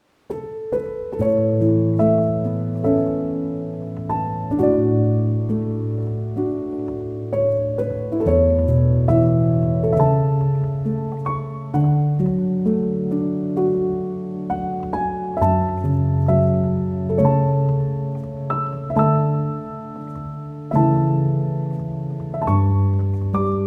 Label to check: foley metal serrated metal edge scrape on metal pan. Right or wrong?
Wrong